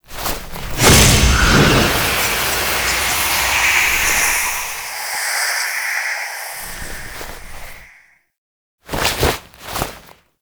Hand Shake Foley; Zariman; Void Kid

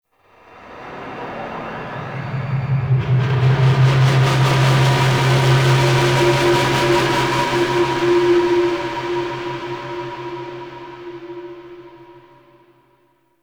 Modular Texture, Ambience; Warframe; Shadowgrapher

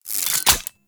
Revolver Rifle Reload; Warframe; Weapons; Tenno

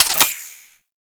Modular Secondary Clip Mech; Warframe; Weapons; Modular Guns; Clips

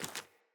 Sandals Walk Rock, footsteps; Player

